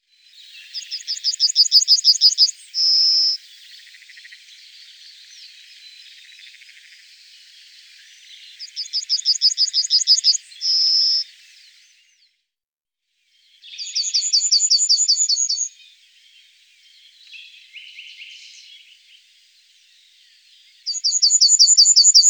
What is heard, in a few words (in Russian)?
zvuki obyknovennoj ovsjanki